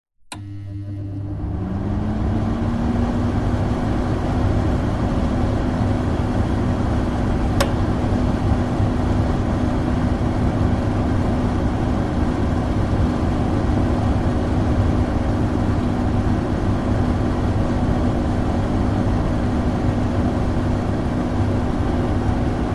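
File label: household dehumidifier switch on run rec near inlet; zapsplat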